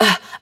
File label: Percussive FX; Vengeance Samples; VEH1 Percussion